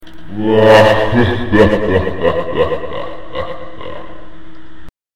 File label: haha